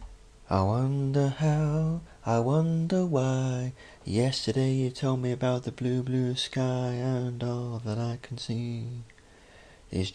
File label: vocal test